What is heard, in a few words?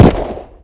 gun, weapons